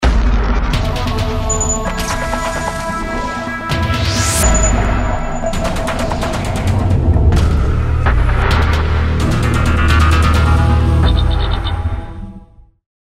TRANSITION NATURE

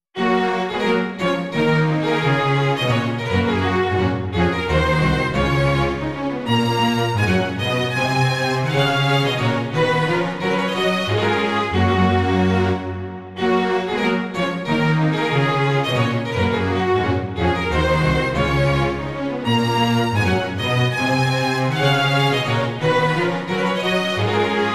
QUATUOR POUR LA REINE

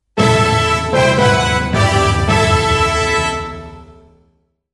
celebration arenes

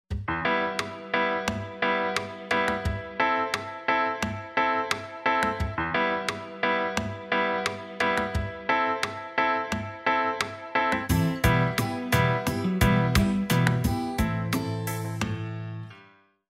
RAEGGE DU VESPA

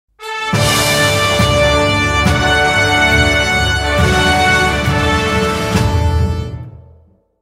RACCORD GÉNÉRIC VIRGULE

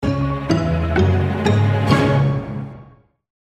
montee

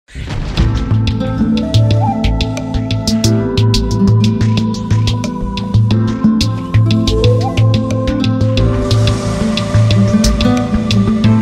CHASSE TRANSITION

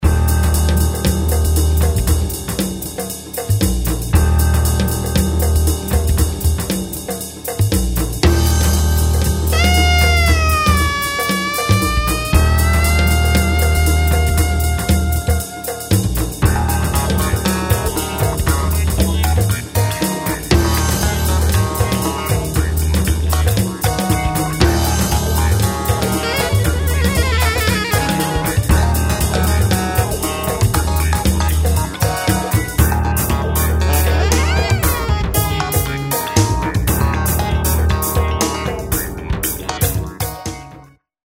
LE SAXO SUR LA MER

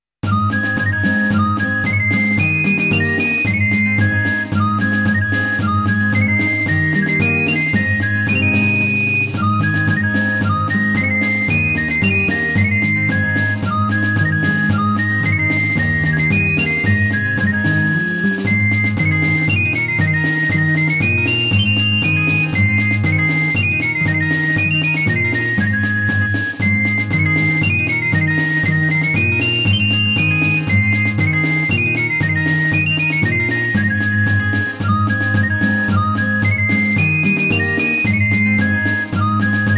FARANDOLE DE COTIGNAC

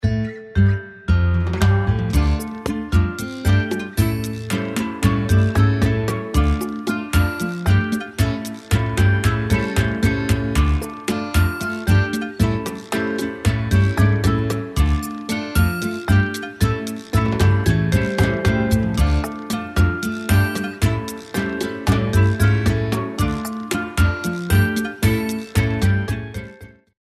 CARAIBO LATINO

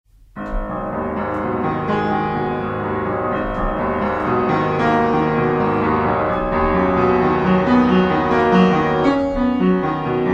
PRELUDE 12 EN SOL DIESE MINEUR

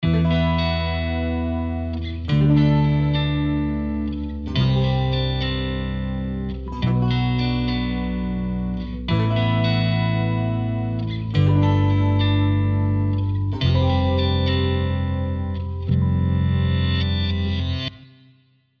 pensif

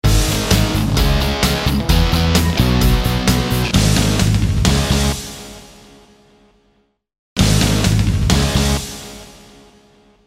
VIRGULE ROCK

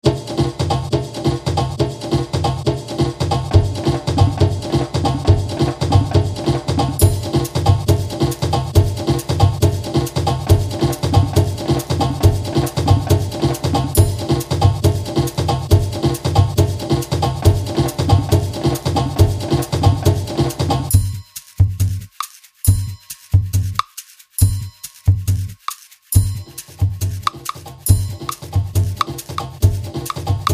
RYTHME AUSTRALE

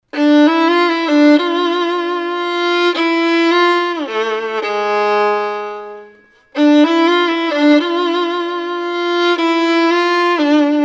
VIOLON SOLO

